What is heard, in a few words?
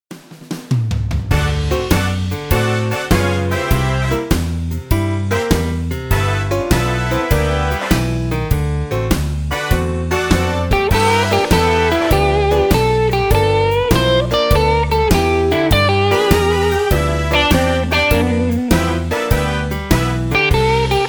Jump Blues